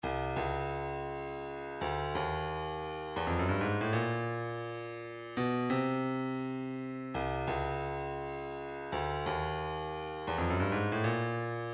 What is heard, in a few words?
135 Post Wavedrum, midi; Postal